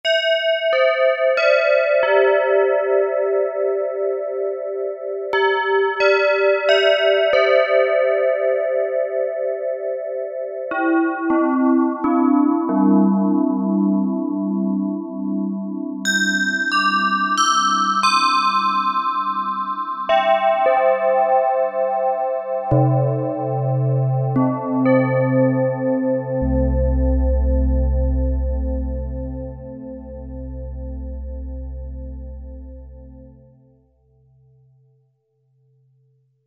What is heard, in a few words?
MALLETS Church Bell; Apple GarageBand 2